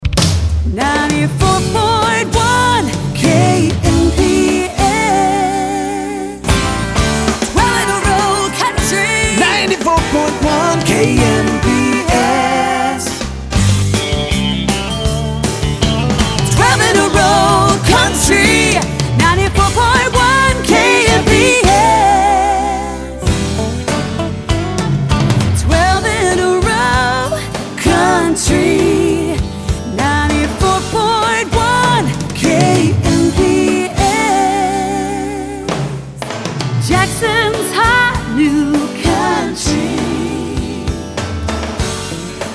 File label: Radio Jingles